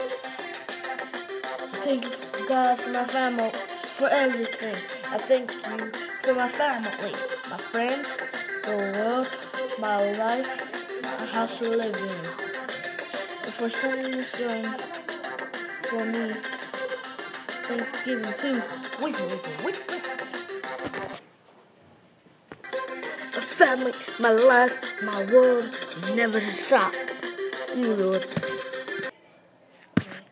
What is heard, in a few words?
rap